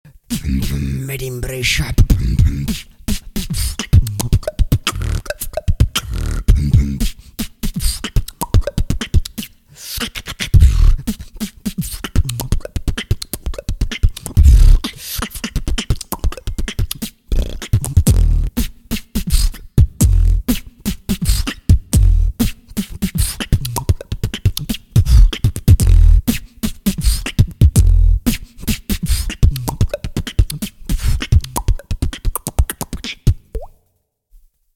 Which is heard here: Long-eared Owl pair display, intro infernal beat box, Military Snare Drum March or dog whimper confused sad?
intro infernal beat box